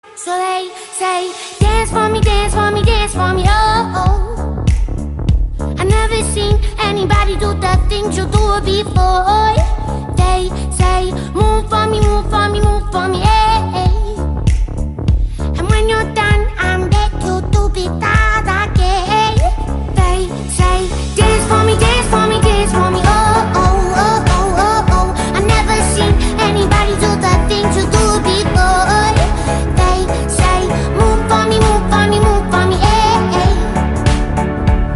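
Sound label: Marimba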